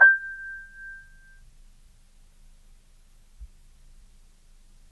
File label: Grand Piano, Kawai, Sustains, G5; Chordophones